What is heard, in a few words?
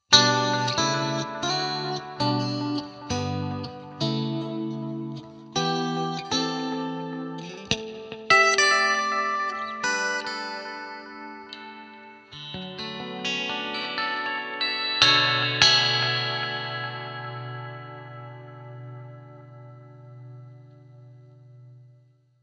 Clean